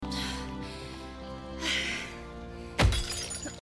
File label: breath surprised noise